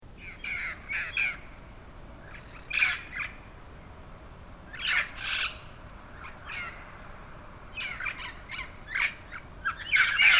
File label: red crowned parrots pasadena